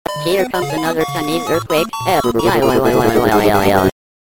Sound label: chinese earthquake